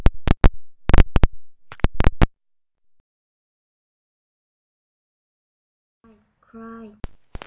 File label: cry